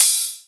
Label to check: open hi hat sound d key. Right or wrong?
Right